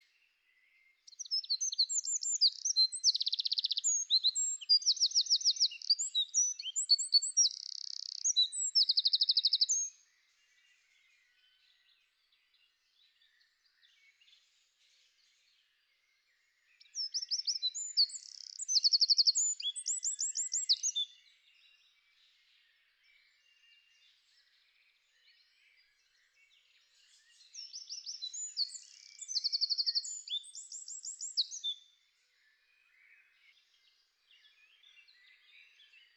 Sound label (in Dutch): winterkoning